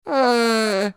eeeeeeeer

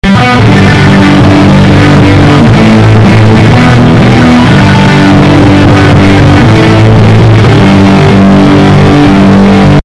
rock version

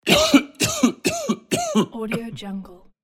coughing

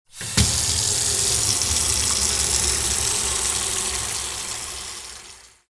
faucet

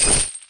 chain armor; diablo2

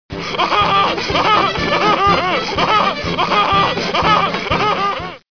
anteater laughing

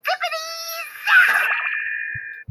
zippity zap

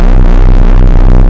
static sound; fnafb4

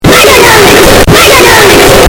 earrape; megaknight

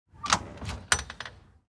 door open

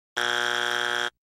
wrong x, wrong answer button

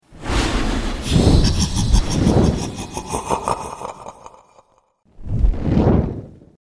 follower notification sound

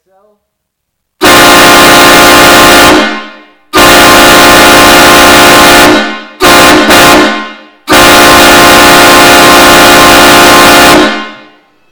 trainhorn earrape

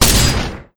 shot; accelerant; hank

